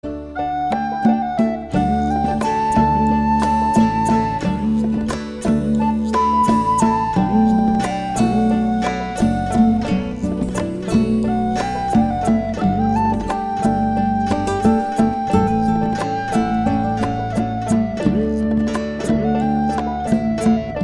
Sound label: Bengali Folk